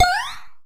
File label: Jump; Zeus; Heavenly Jump